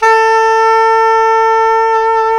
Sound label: SAX SOP SAX, Soprano Long